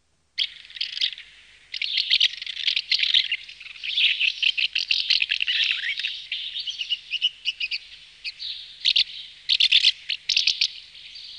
Molnбrfecske; Madarak

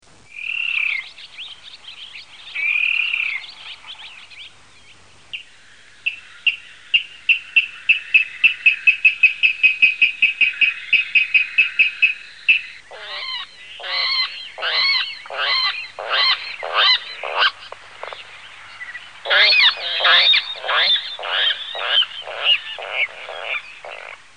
Guvat; Madarak

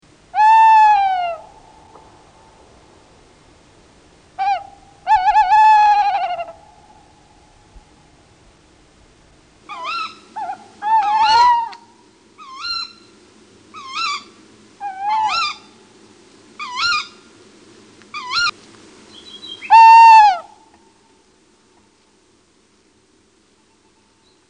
Macskabagoly; Madarak

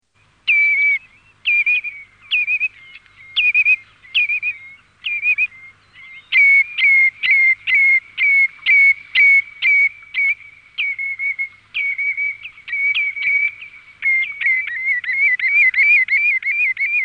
Piroslбbъ cankу; Madarak

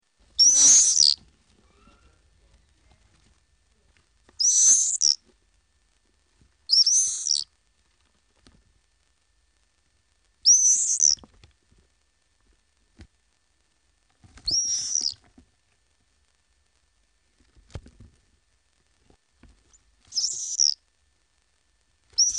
Sarlуsfecske; Madarak

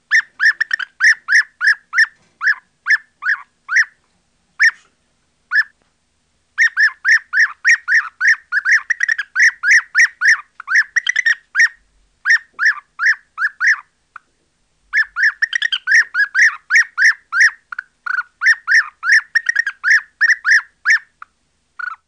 Gyurgyalag; Madarak

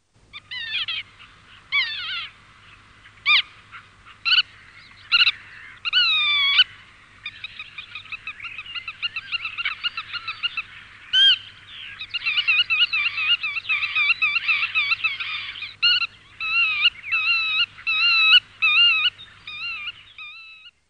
Nagy goda, Madarak

